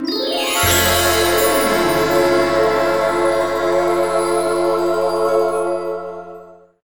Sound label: Goal Fanfare; crash bandicoot series sound box; Once Upon a Tire; 4th8th Place